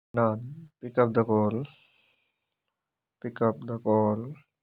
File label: pick up the call ringtone